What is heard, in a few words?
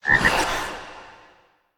ghost impact; smite; zhongkui